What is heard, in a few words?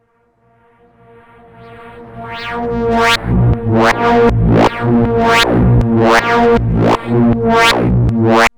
Revertist, Drum n Bass, Pads; KJ Sawka; Drum n Bass & Beyond